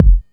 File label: Soft Kick; Vengeance Samples